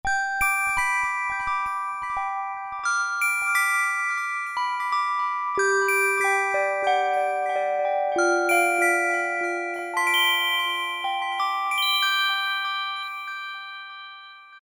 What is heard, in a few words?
Roland JP-8000, Bells; MKS80 Bells; Factory Patches